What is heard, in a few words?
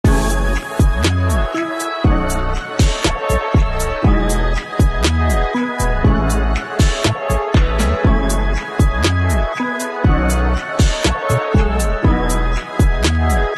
Beats Calling Out